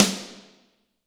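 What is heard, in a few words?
ambience snare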